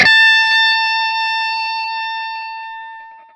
SINGLE A, Vintage Blues Guitar, ELEC.GTR; AKAI; USB Soundscan vol.22

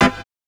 chords

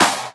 Claps; Vengeance - Essential Clubsounds Vol.5

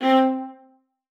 MART C4, VIOLA SPICC; Xsample VOL-5; 90 s Sample CDs

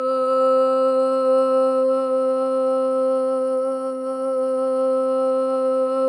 solo boy; Spectrasonics; Symphony of Voices

